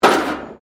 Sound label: METAL BIN IMPACT CONCRETE; Materials